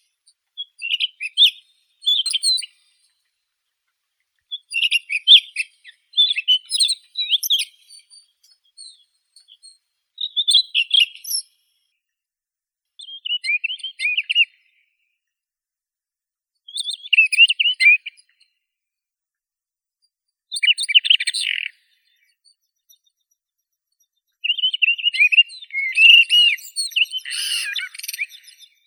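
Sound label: redstart, Phoenicurus erythrogaster; gorihvostka krasnobruhaya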